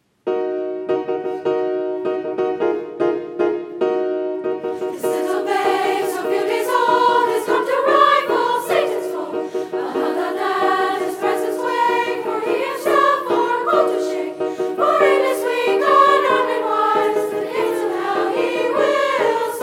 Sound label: WOMENS CHOIR; CAMBIATA